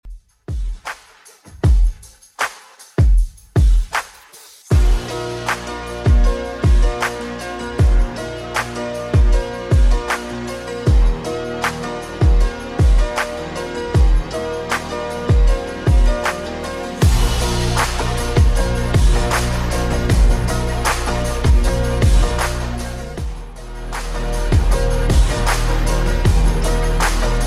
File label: 2ks Redrum 78bpm